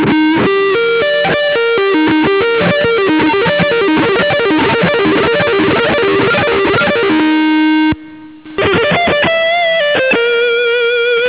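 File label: decorative sweep, guitar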